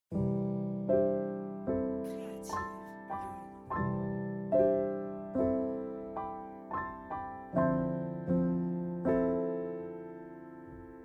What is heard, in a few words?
piano